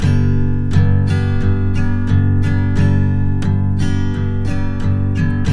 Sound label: TK MJ G#2, Guitars; Spectrasonics; Hans Zimmer Guitars VOL-1